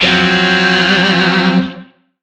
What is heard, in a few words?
Shawzin Hirajoshi Chord; Warframe; Lizzie Shawzin